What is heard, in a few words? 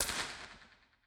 Scala Surround Right Back, IR Scala; Stereo